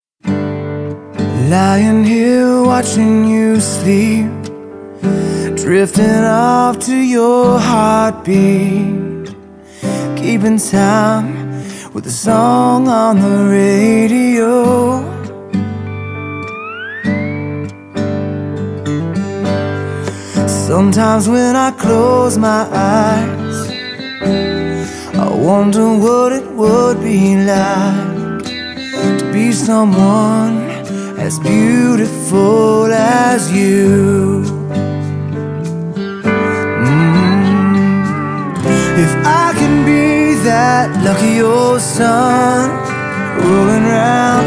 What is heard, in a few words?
Ballad Male Alternative Love Song